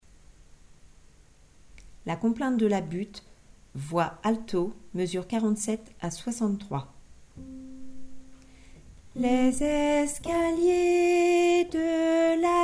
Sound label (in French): la complainte alto